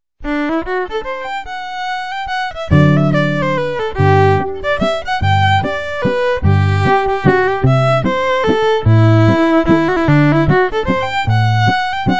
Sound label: Waltz G; Valses